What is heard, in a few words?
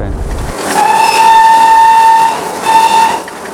whistle, castle class